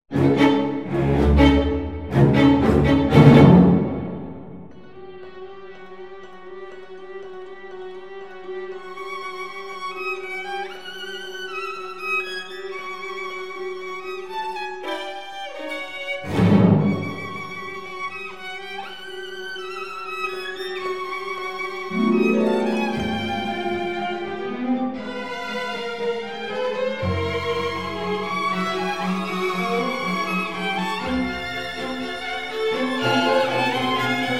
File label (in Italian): Violino Solo; Assemblaggio